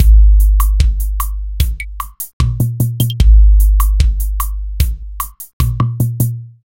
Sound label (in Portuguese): Levada